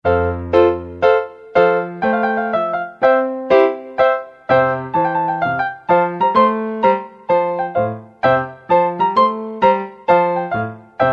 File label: courante, piano